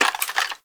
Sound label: Shotgun Reload Handle Foley; Warframe; Zoboz Shotgun Skin